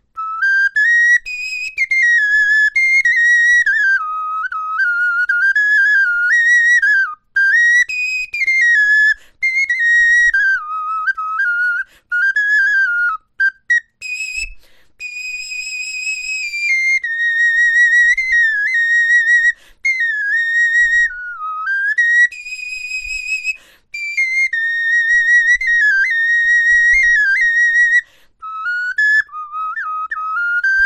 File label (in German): melodie, knochenfloeten